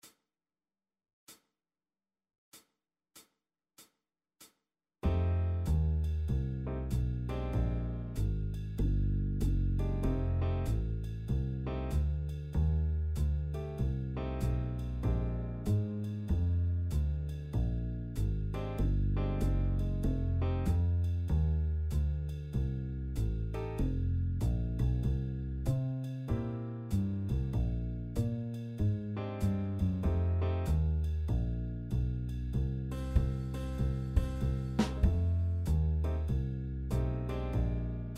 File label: improv backing track